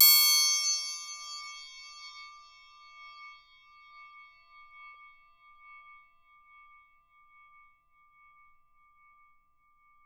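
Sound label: SM TRI PAN left, percussion; Miroslav Vitous - Percussion & Harp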